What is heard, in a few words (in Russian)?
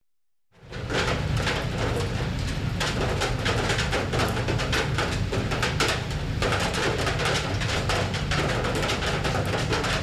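rain drips on a metal roof; krovlya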